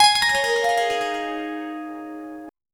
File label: gentle harp pluck with; cottage